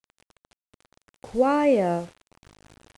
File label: choir